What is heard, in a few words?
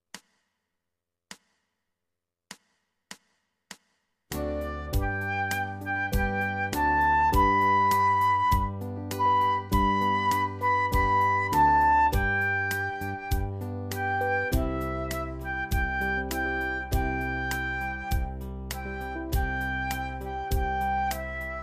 Worried Man Blues